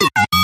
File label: Droid; Programmed